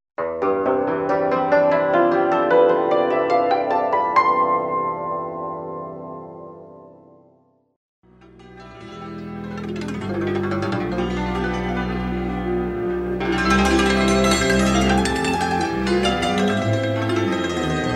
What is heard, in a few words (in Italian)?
cymbalon